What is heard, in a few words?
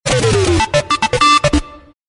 beep bouncing electronic